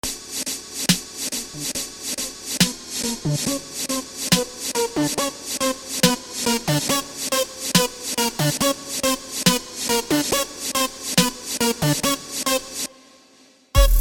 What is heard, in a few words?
trap